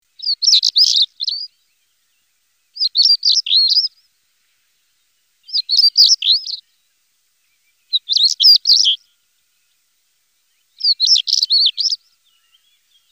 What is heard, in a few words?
roodborsttapuit stonechat